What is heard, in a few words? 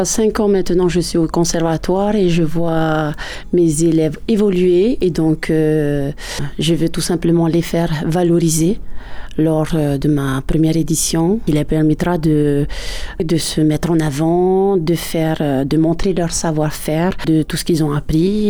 Ukulele; concours; jeunes talents